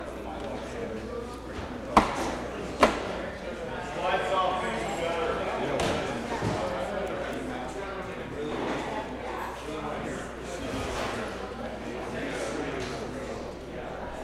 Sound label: Lethal Firetrap, NoiseFest